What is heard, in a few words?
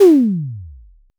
sub drop